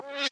fly; Animals

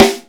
Snares, Real

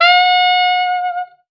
sax; Winds

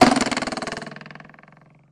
boing

